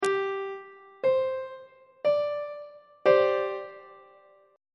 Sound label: f double sharp suspended 4th chord; on treble clef